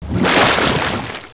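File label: water out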